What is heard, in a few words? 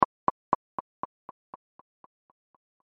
Click Delay Pan; Chopper Static Pulse Ascend; Multimedia; Blastwave